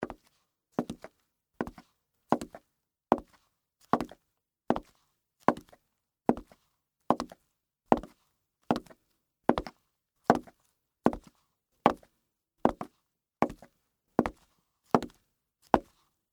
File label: Pumps Walk, Footsteps, Peep, Toe, Concrete; BlastWave